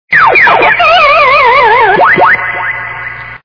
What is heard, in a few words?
pacman dies